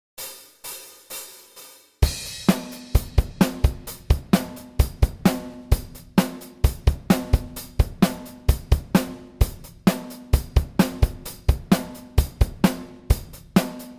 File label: Chords Riff